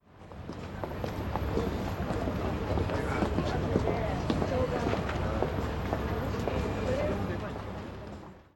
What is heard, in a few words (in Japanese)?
繁華街